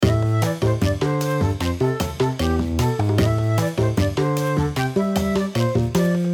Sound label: Short mistery